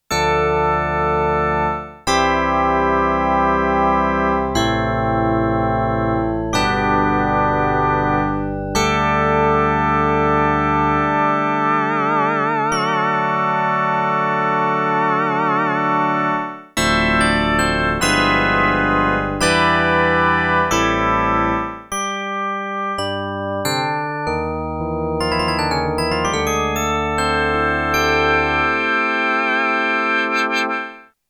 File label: SYNTH ORGAN I, Korg DW-8000; Factory Patches